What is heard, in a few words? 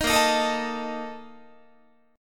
A sharp Major 7th Suspended 4th, Guitar; strummed